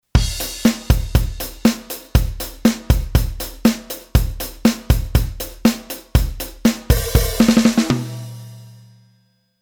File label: Velo; Midi Basic